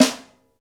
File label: St Snare, Roland BK-5; Snares